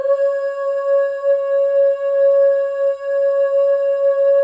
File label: FEM OOS, Symphony of Voices